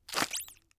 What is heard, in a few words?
jump slippery; fallguys